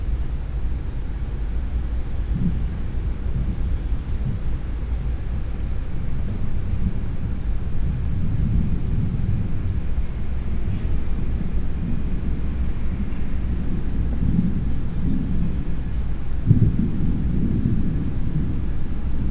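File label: ambience bar; Pilot Down Behind Enemy Lines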